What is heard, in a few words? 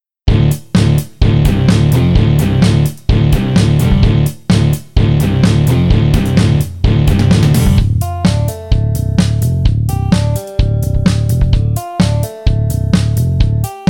Drums Bass Guitar